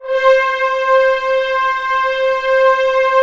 ORC C4 PP, Orchestra; Sound On Sound - Peter Siedleczek's Orchestra

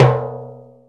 Timbale Center; Planet Earth; Digital Sound Factory Planet Earth

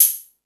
Hip Hop, Drum Machines

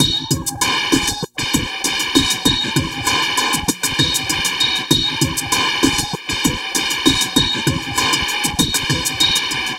Trap Loop; Sonic Foundry (Sony Creative Software); Esoterik Beatz

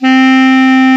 KLARINETT C, Alpine Volksmusik

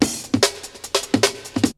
BREAKBEATS, TECHNO

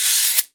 LARGE C, CABASA; Voice Crystal - Steve Reid Definitive Percussion; CABASA+GONZA

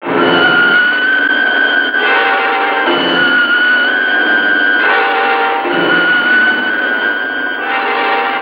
Ancient Music FX, Industrial

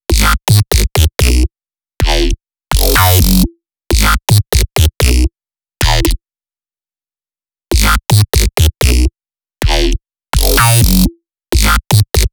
bass

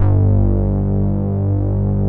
MUTED PADS; Pro-Rec - Synthsonic

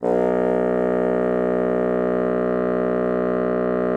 bassoon, B1; Xsample VOL-6

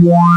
ppg resonant, wavetables

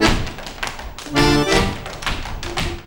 ACCORDEON; Best Service; World Colours; ACCORDEON+ST

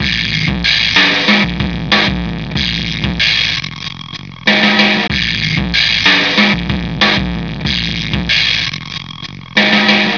System Overload Beat, processed drum kits; Loop Samples

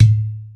Udu Tone Hi; EMU Planet-Earth

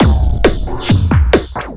Beat, Drums & Percussion; Electrocution; Beats

